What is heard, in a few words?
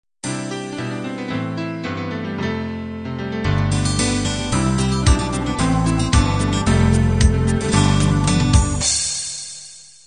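pop 16 beat intro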